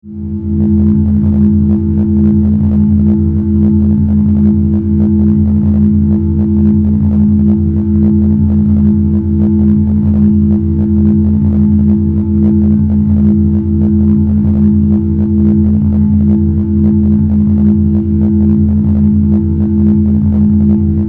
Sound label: Force, Field, Energy, Source, Scifi